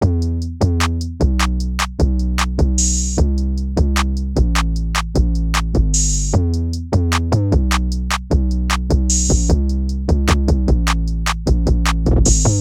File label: Type Beat Drum Example